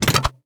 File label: Attack Crossbow; Game Essentials